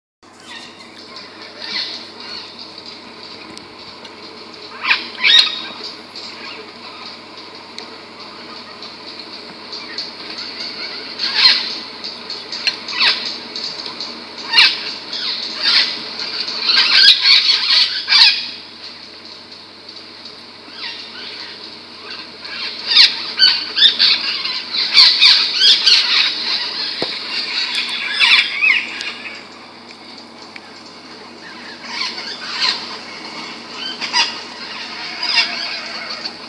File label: Cuban Parrot, songs